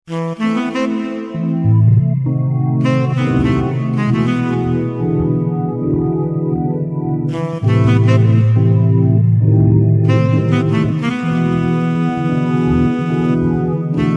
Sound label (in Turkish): roland gw8 nostalji